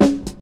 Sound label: clear snare one shot c sharp key